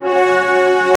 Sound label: sound effect for modern a, warfare